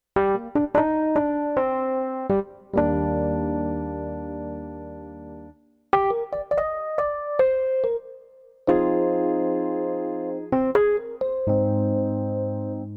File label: EPiano